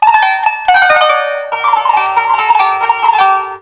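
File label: KANU, Oriental; Best Service; Ethnic Journey Sound Cube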